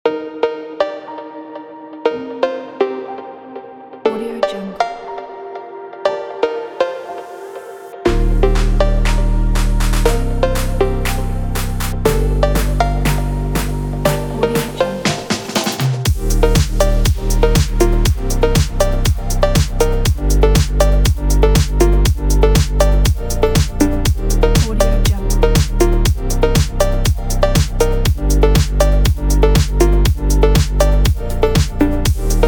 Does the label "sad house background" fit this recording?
Yes